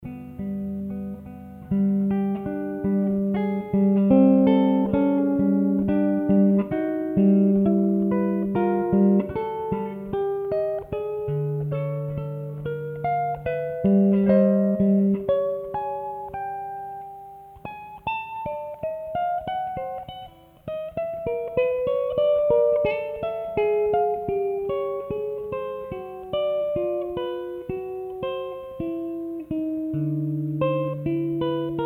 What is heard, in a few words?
improvisation, solo guitar; Ted Greene